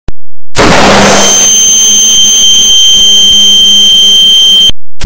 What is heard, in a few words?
flashbang jumpscare loud